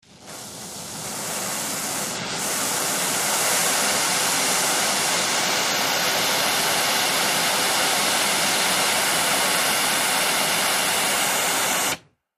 Welding, oxygen - acetylene, heavy flame

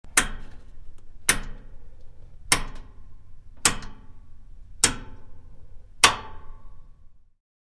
Metal Dial Large Meta, switch clicks

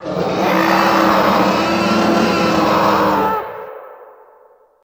Elephant Monkey Howl

